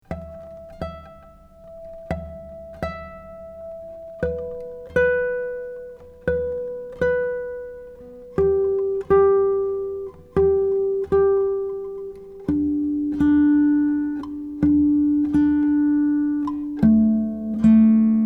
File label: Intonation, Cathedral Guitars